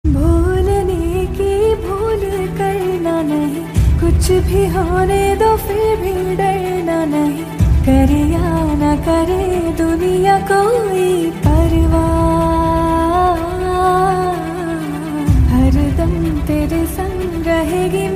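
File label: background music Ringtone; KGF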